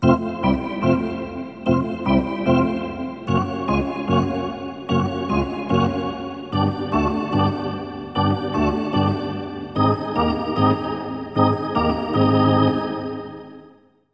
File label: Hockey Organ